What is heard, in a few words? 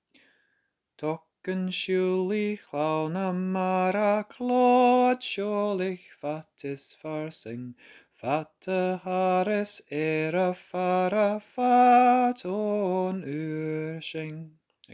Weavers Song verse, choir